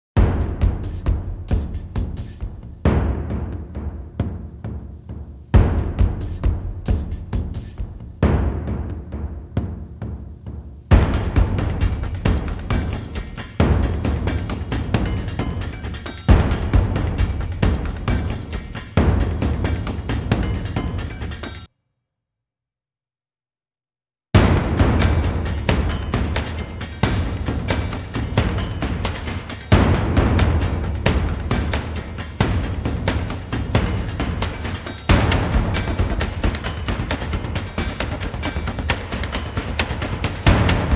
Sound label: High Drums